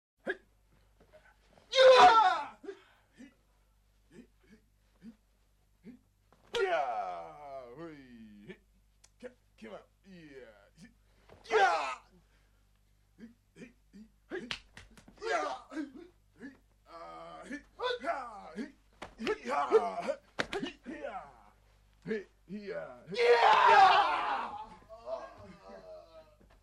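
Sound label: karate killings, fight and killing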